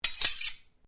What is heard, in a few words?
Blade Swish Low, Sword